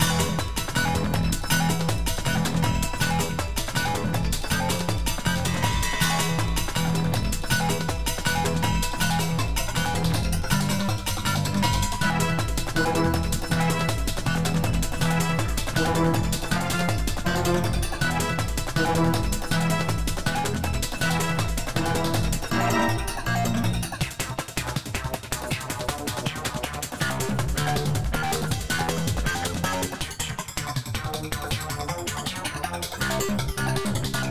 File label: Action LOOP, soundtracks